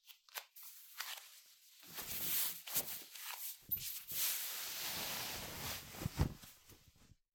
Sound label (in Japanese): パンツを履く音